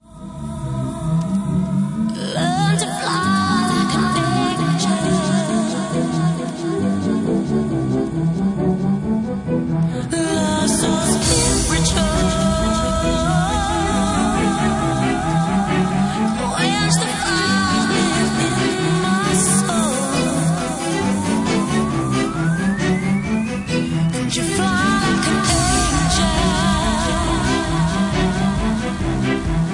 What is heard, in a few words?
Organ 2 Bass